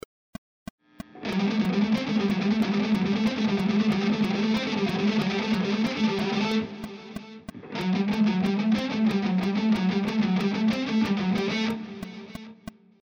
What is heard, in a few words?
picking exercises melodic phrases